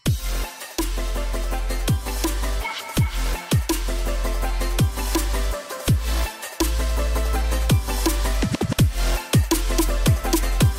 SUB SOUND; SUMMIT1G

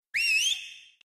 Hey Girl Whistle

